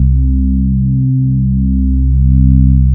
VAGUE C2, BASSES; Best Service Alpha Dance vol.2

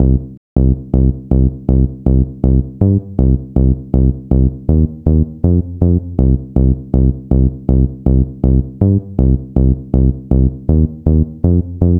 gro 160, Hardcore Loops, Bass Loops; Dance Synths

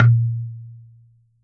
coconut; Unique Instr

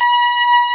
HAM E6, HAMMONDS; East West; Global Sounds

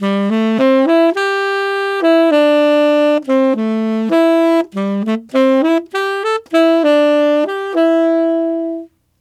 Tenor Latin in A min, Tenor Sax Loops; Sonic Foundry (Sony Creative Software); Jazz Solos and Sections

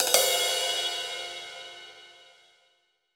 Crash Phrase, Roland BK-5 Cymbals

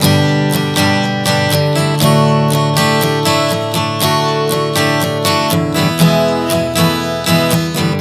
G-D-E 120 bpm, acoustic guitar chord progressions

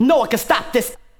VOX GENERAL; Megabass Remix! 2

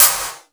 METAL S; Crypton - DrumBase5000; METALIC DRM2

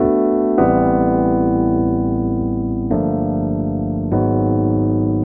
E.PIANO, KEYS

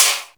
AL INDUST; AKAI S6000 CD-ROM - Volume 1; PERCUSSION

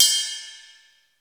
RIDE CUP, DRUMS; Crypton - DrumBase5000; T1 DRUMS

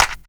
Clap; Equipped Music; Loungin House

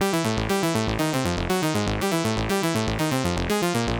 SH101 Arp (120BPM), retro house

